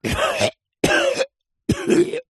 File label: human male man cough; zapsplat